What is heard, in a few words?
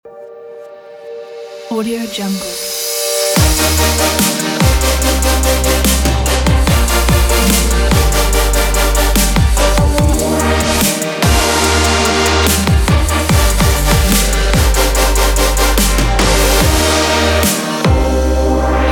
future bass